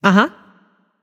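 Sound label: agree, female voice; smite; voicepack; hera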